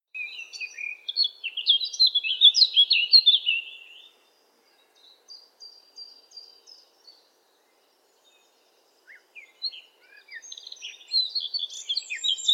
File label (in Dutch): zwartkop zang